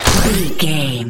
sci-fi weapon reload

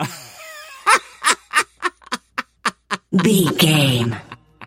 voice male laugh high long

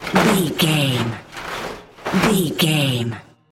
metal sheet impacts

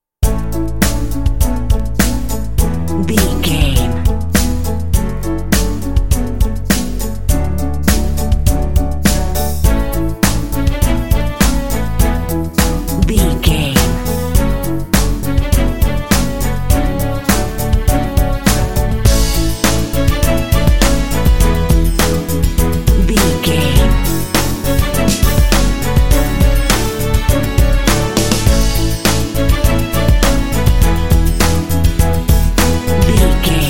orchestral upbeat pop strings

